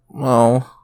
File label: Chorus really sad